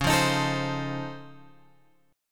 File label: C sharp Augmented Major 9th down Guitar Open F, chord; C#+M9; strummed; JGuitar